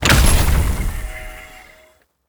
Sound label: cy punch